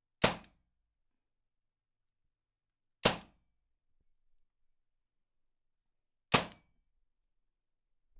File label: Paintball Gun Shot